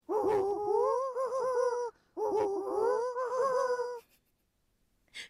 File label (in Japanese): キジバトの鳴き声; 声マネキング; 声マネキング対決; フブみこさん; ホロライブ; さくらみこ